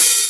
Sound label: Crunchy Open Hi Hat Sample F# Key, Royality free open hi hat tuned to the F# note